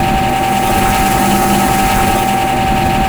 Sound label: CEMENT TRUCK; Spectrasonic Distorted Reality 1; BIZZAROS